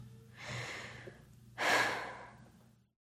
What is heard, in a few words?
exhale loud